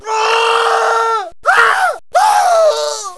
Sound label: fake death; chaos